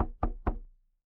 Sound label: impact wood knock